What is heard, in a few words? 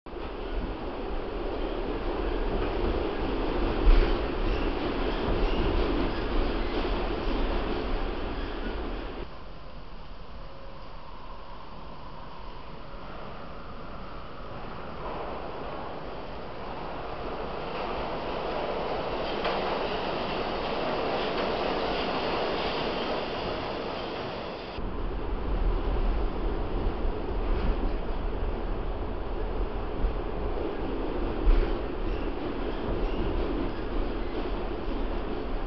Subway Ambiance Train Noise